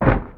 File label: Sandypaper; Zero-G; Total Drum Bass; Hits and Bits